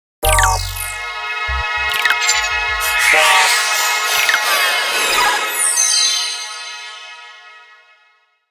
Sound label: Composition Shimmer Twinkle Warble Bursts; 5.1 STEREO MIX; Blastwave